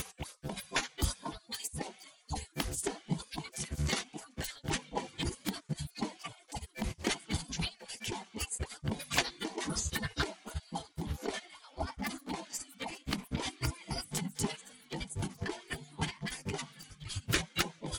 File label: drums; SiSEC11